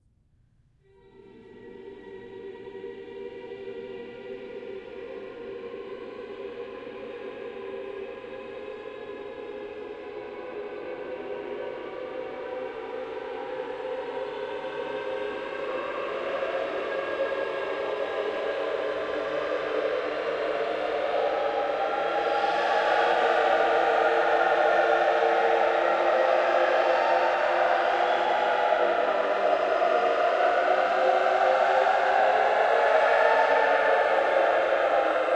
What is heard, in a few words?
Choir; Outlast 2; PS4 Windows Xbox One